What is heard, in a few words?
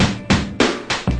100 two beat, drum loops and breaks